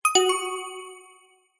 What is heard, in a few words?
multimedia game sound mobile game warm positive tone